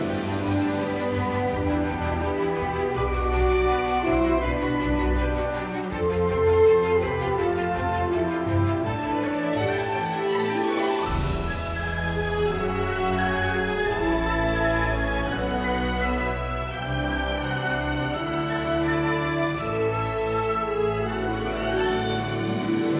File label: music loop